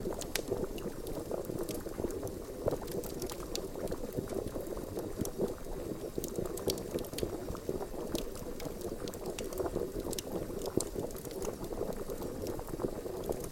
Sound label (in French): Bouillon cheminée